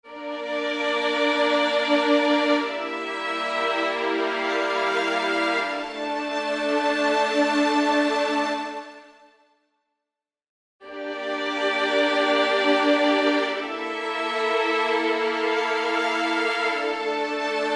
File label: Violins; Guess the synthesizer game